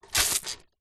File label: leisure toy water gun pump action plastic squirt water